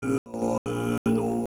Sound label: Intense